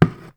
BUCKET DROP; don t be afraid the first toy

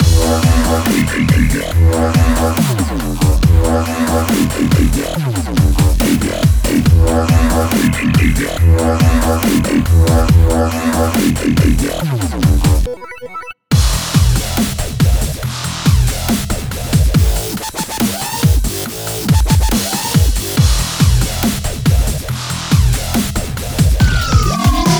Dubstep; Pinball FX2; Deadpool; Multiball

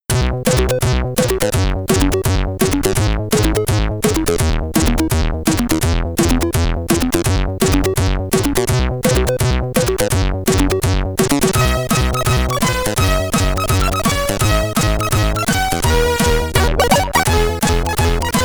Pachebel's Canon, SID Stereo; lemmings commodore 64; Lemming 1

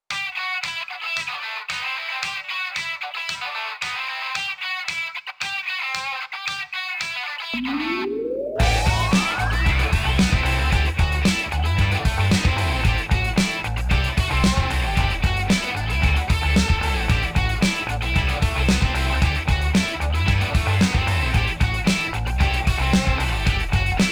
Radio Rock; Gangstar West Coast Hustle; Android

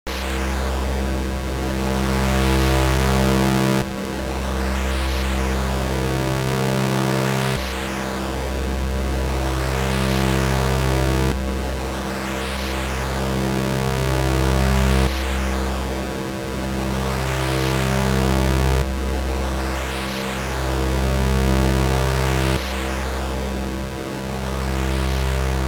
Approaching Boss Arena; Teenage Mutant Ninja Turtles 3 Radical Rescue; Gameboy

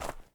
Footstep Snow; Among Us

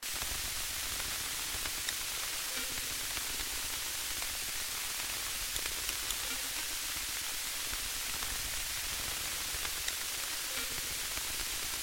Waterfall; Dino Run

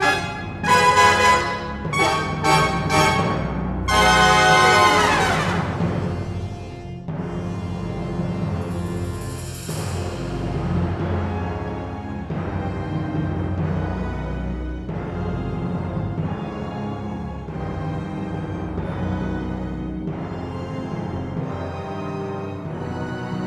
MUSIC Action

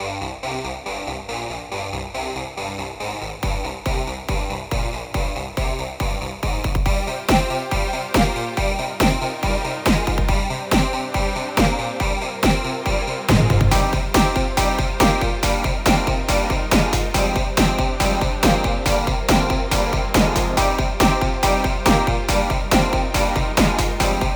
Instrumental

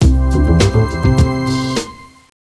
Win; mechanic master 2

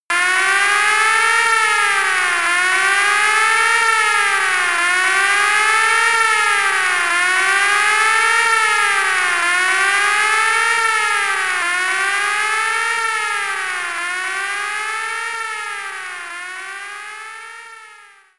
Warning (Siren); Airmania; Amiga

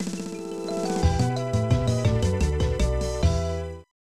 Level Failed; Troddlers; SNES

